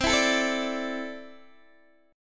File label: C 6th Add 9th C down Guitar DADGAD